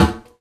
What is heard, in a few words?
Didgeridoo Kit Samples